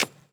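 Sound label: snare drum bass drum, Dance Mega Drums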